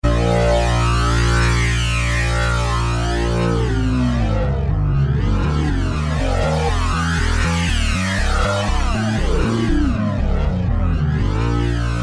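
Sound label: Buz Pad B flat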